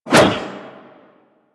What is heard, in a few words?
Staff Whoosh; Golden Band; Warframe; Monkey King